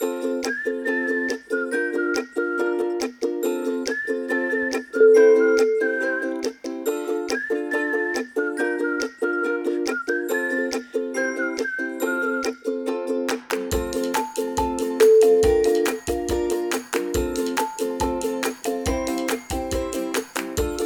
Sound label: sunny advert